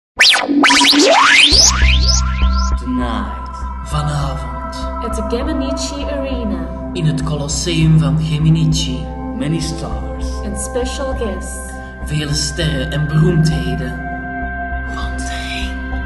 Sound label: Jingle